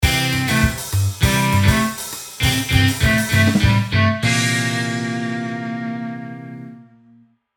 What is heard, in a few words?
Plot transition theme break sitcom